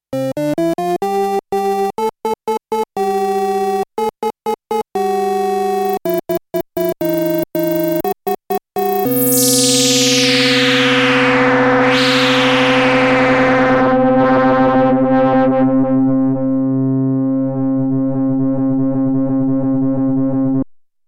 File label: Vermona; Vermona 14